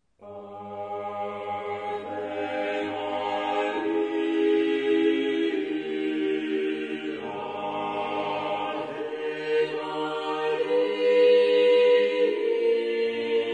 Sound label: Ave Maria, chorus; Alonso Lobo